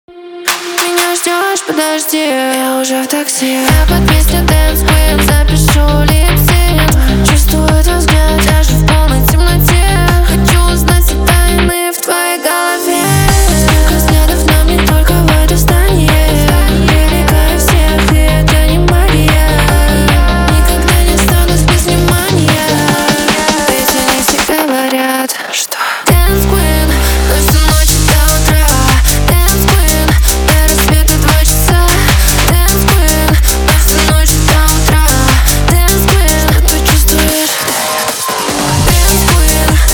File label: dance remix